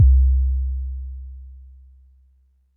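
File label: Sub bass soft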